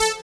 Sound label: SYN DANCE; Sound & Vision - Gigapack II